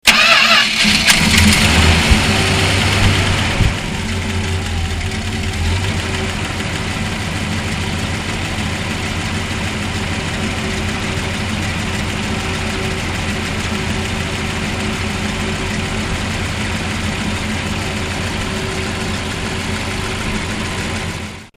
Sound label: 1964 chrysler windsor